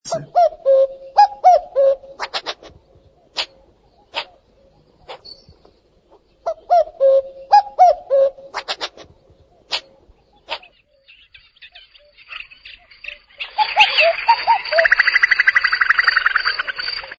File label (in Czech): zvuk, ptaci